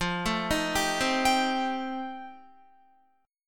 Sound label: F 9th F Guitar, chord; arpeggiated